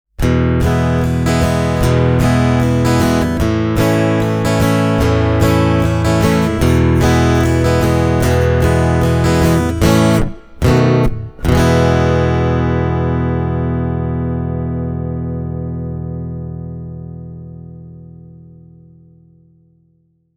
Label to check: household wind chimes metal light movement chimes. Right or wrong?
Wrong